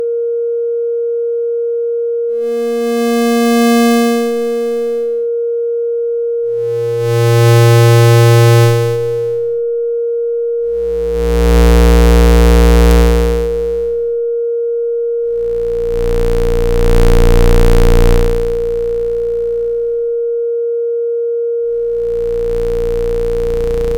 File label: sine